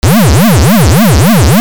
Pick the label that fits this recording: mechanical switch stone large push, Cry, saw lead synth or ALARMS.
ALARMS